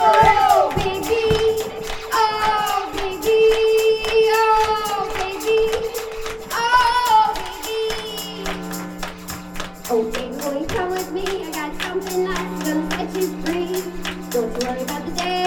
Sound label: Handclaps